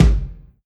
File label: reverb kick drum a key; kick drum samples